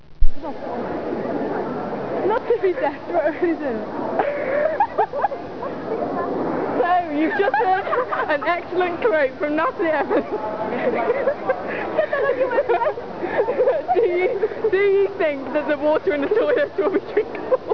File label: toilet water